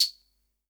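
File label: SR95 tambourine; Best Service; Dance Mega Drums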